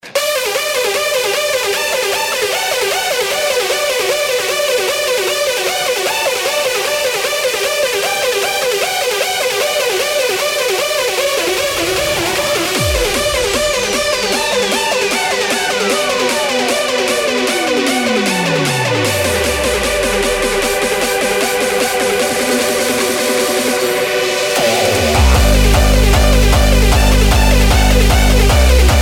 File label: Music Bassline